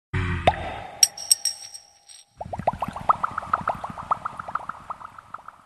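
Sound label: Soda Bottle Opening Ringtone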